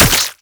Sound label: Brood Spider Attack; Warframe; Oraxia